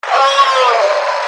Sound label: fear die